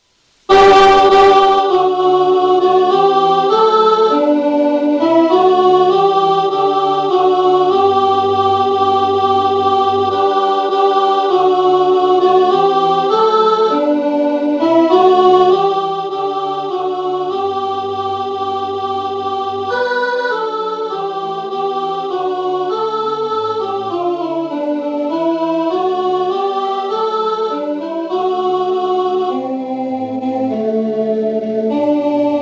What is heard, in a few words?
Alto, rehearsal